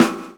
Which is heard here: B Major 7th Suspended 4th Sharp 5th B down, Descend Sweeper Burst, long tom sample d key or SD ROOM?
SD ROOM